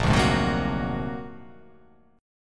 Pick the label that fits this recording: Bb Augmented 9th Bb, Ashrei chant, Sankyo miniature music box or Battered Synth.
Bb Augmented 9th Bb